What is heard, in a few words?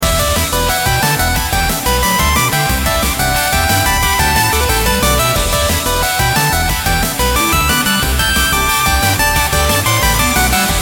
Miniboss (180bpm)